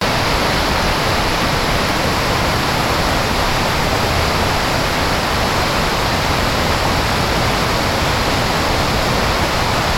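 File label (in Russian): стремительный водный поток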